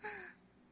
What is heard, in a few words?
ahh